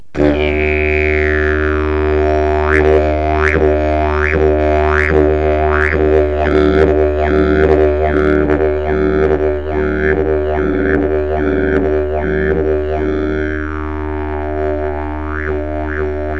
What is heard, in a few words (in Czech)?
eukalypt koncertni E2, didgeridoo; drevene didgeridoo